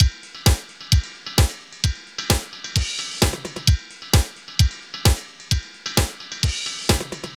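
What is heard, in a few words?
drum loops, right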